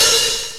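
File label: Kawai R-100 hi-hat open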